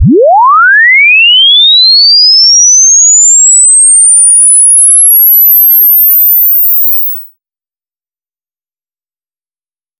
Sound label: sine sweep linear; Tones